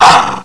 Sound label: pain; wanted